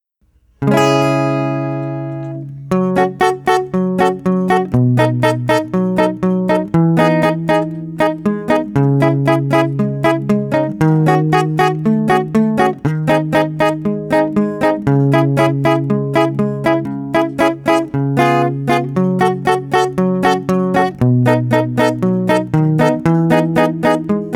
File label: 2nd guitar